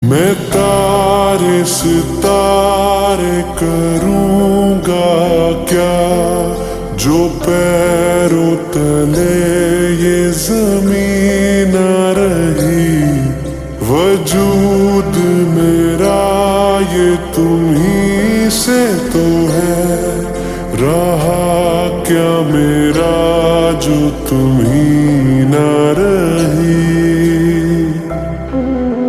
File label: Ringtone slowed and reverb